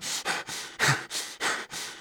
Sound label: male out of breath; Zomboid 41.78.16; Project Zomboid